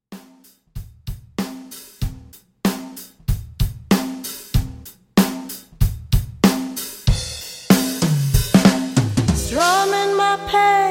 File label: 70s Classic, 95 Bpm; ReDrum